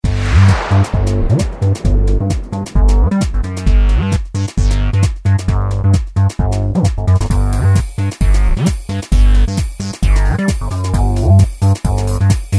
Syn Zone, Yamaha YPT-400; Factory Songs

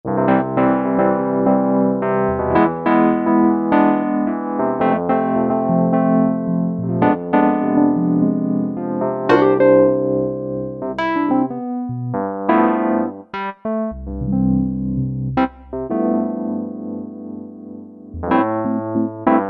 Roland SRX-07 Ultimate Keys; Real Thing

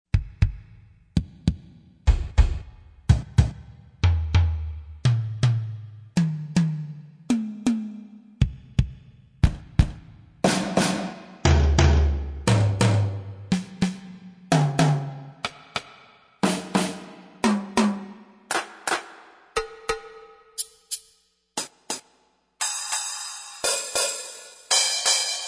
Drum Set, Yamaha TG55; Factory Presets

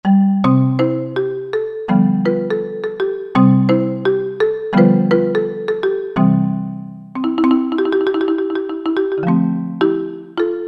PC Marimba, Yamaha TG77; Factory Presets

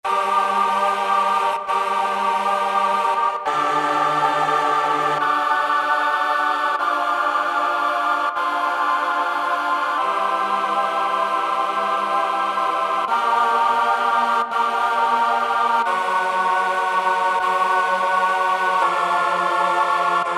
Tron Choir, Alesis Z4 Vintage Keyboards

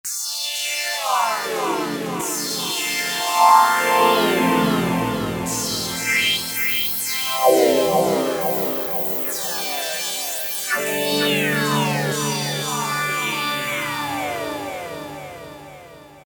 Lazy Pitch, Korg MS2000; Factory Patches

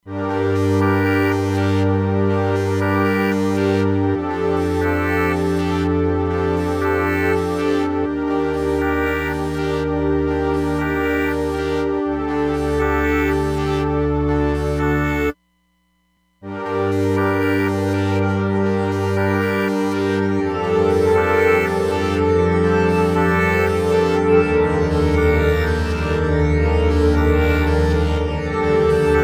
Flanged Infinite, Roland DEP-5; Factory Patches

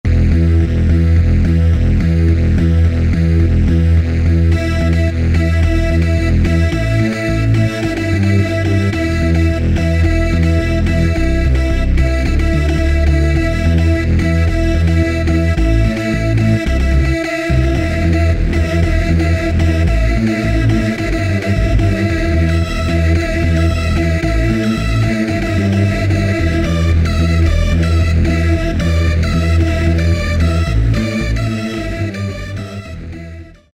Techno Bass Synth, Korg TR-Rack

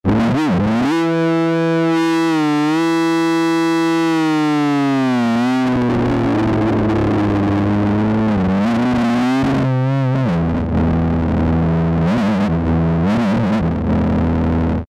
Distorted Annoyance, Alesis airSynth

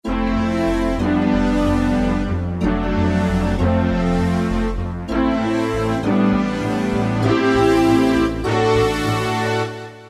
Eye Brasspad, Roland PN-D50-03

